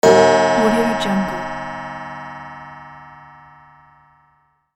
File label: wall clock ding